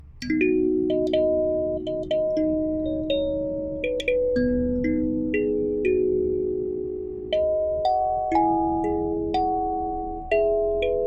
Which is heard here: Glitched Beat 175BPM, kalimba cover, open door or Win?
kalimba cover